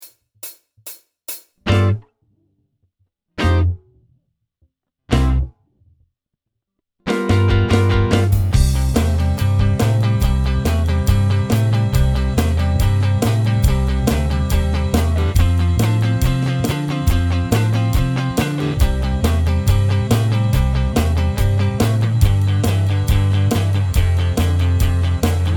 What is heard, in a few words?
backing